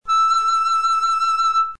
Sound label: E6 split E, flute; modern